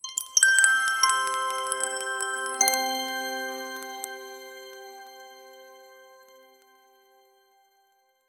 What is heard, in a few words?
generate a short pleasant, attention grabbing